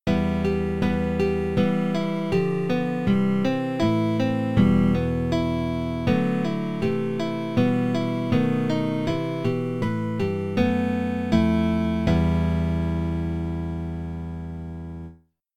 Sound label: Setup Music; Zorro; DOS